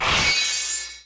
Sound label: spec sword